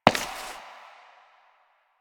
Step Ice; Warframe; Koumei

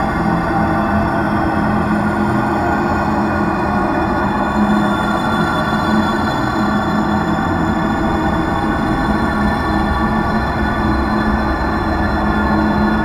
Space Trench Run Amb; Ambience; Space Battles; Exterior; Warframe

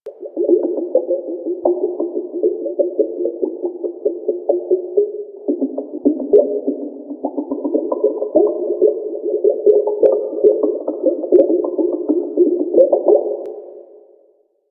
Fish Tank Small Loop; Warframe; Liset; Hub; Personal Quarters

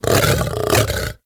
Feral Vocal Leg Pull; Warframe; Kubrow

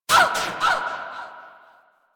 Player Hit Death; Warframe; Mini Games; Caliber Chicks Minigame

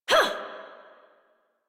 Exert, Adult; Melee Swing; en; Warframe; New War; Adult Operator

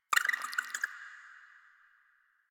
Rat Pet Squeak

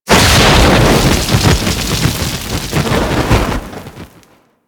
Flame Burst; Explosions; Warframe

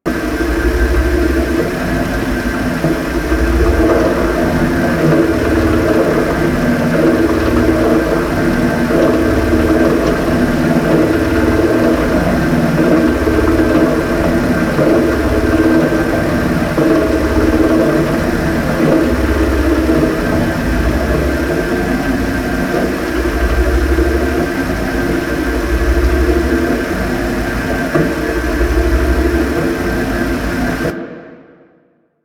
Veil Machine Amb Loop; Venus; Warframe; Veilbreaker; Quests; Ambience; Kahl

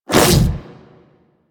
Infested Splitter melee swipe small

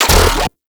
Infested Shotgun Dry Fire; Weapons

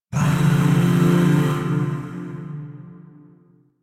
Zariman Void Shawzin Maj Chord

